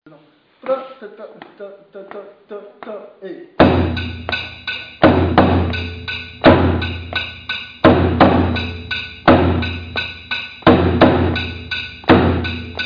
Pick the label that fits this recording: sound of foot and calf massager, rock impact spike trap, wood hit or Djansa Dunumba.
Djansa Dunumba